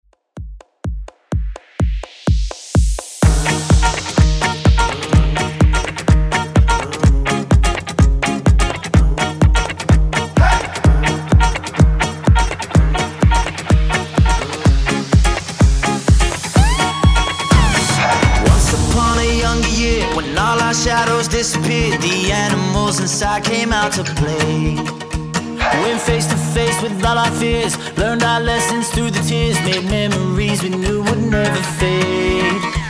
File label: Dance Redrum